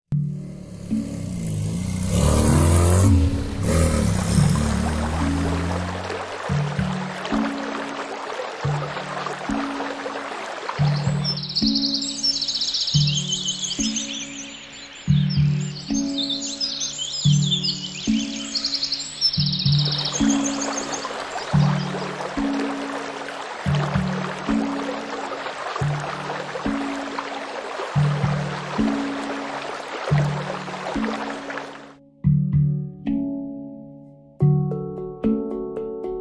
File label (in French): hang